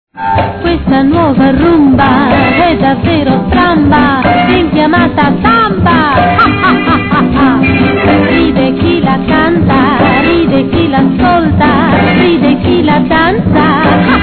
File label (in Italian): La Rumba Della Risata